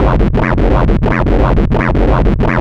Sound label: bass; EZRollers